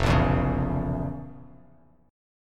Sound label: F Major 7th Suspended 4th Sharp 5th F, Bass Standard; FM7sus4#5 strummed; JGuitar